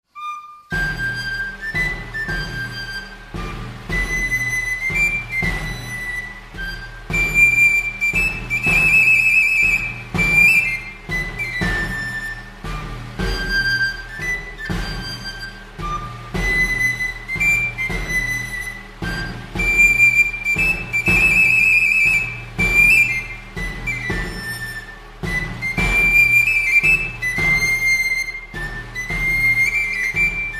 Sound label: Marche de St Sebastien, Tambourin; Academie Tambourin